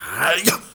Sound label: HEY HEY, MALE NOISE, Heart of Africa